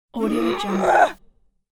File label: female combat scream